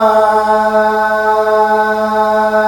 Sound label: MALE AH A 2, SOLO, Africa; Spectrasonics; Heart of Africa 1